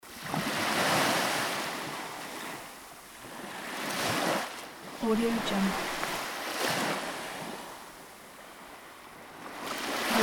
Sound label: sea waves